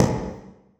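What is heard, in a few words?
conga muted, Percussion; Sonatina Symphonic Orchestra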